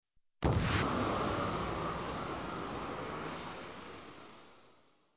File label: Blast distant